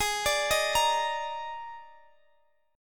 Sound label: Eb Major 7th Suspended 4th Ab